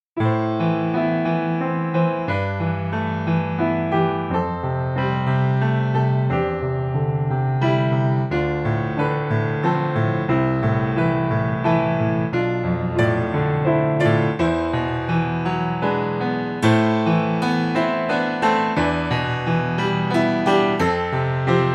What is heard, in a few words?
Yamaha C7